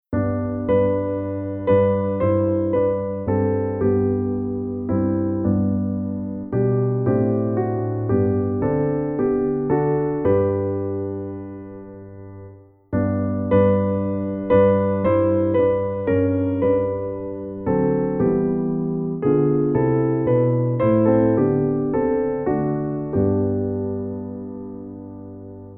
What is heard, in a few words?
hymn